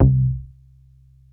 Bass Synths hits and octaves

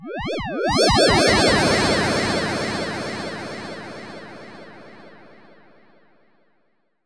OUT SPACE; AKAI Anime Sound FX

